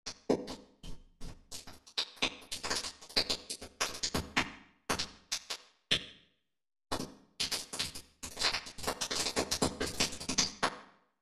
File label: Bright room; reverbs; MPX1